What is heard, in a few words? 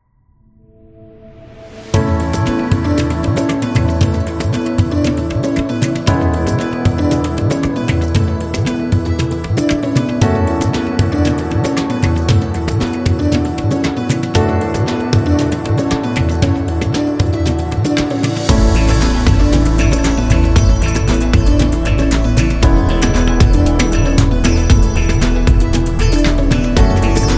India Music